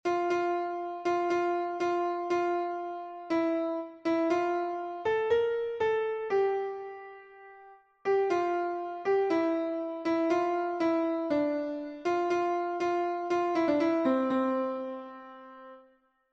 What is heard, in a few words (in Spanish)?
CONTRALTO, himnos en piano; cantos del camino